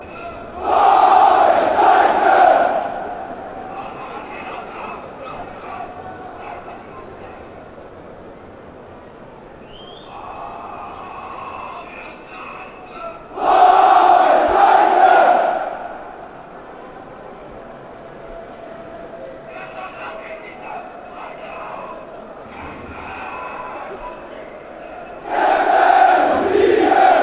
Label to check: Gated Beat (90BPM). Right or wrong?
Wrong